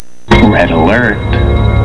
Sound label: red alert